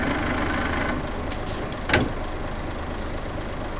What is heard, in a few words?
gear